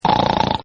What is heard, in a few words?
Snore; Snoring; Human; Partners In Rhyme